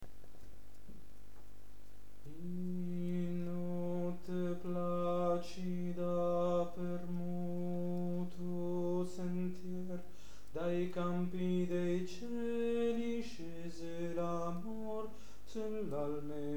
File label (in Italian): Bassi; In notte placida